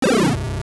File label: Turtle Jump; Veran [Turtle form] jumping (also Digdogger miniatures dying, and Link using the Megaphone on Talon); Zelda Oracle